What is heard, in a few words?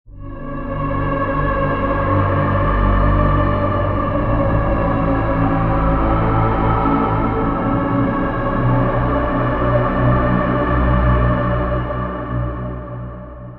Pad; Phoenix Chamber; Routed Sound; Vital Expansion - Flame Summoner Vol.1